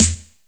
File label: depends, Snares Electro